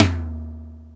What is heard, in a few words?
TOM, Cheez; Club-50 - Foundations Roland